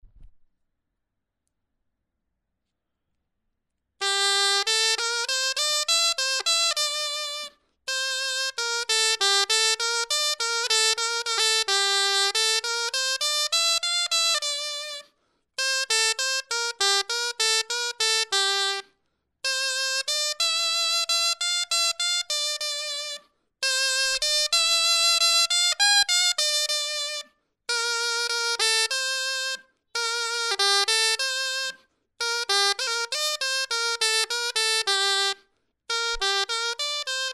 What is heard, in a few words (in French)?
clarin etude